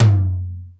ROCK TOM LOW; Greytsounds VOL-1

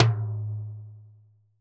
tom, Roland MC-909

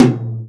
tom, Real Drum Kit, jungle kit

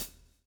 SWING

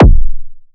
Borg Kick, drum kit; Polyend; Stazma & Concrete Collage; Concrete-Dual Borg

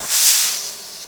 Brush Swish, Korg 05R-W Snares

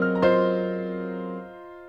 CHORD L, Drum & Bass 140 BPM; Ueberschall; Drum & Bass Flash Trackz

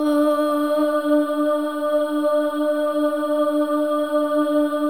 SOLO BOY, AH; Spectrasonics; Symphony of Voices

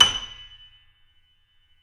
YC7 piano, ff; Roland L-CD701; ff YC7 St

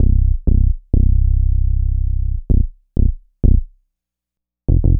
Dubby Bass; Dr. Fink's Funk Factory

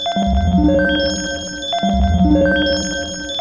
BLEEP 3, SPACY BLEEPS; Zero G; Malice In Wonderland

